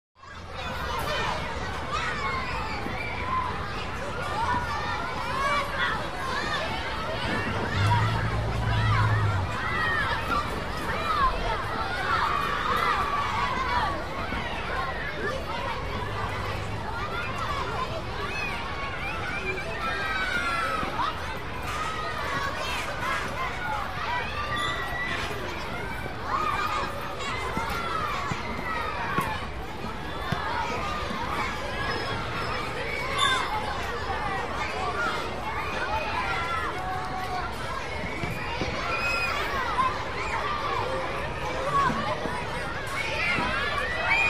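Elementary School Playground Close Perspective With Many Energetic Children Playing; Yells Squeals, Metal Rattles, Ball Kicks, Busy Movement Close To Distant.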